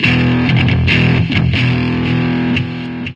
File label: bass solo; source engine; tf